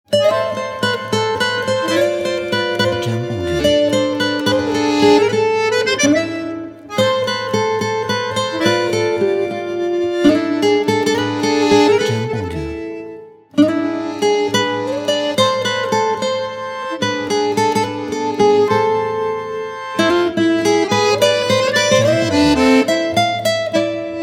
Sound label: France Romantic Accordeon